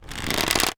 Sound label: Longbow Pull Back; Timelock VR